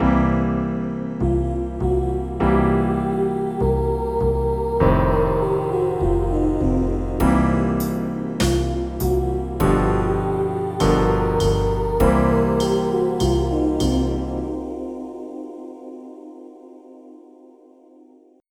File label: MELODY; pblue